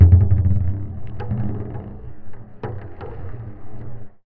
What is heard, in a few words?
Marbles & Framedrum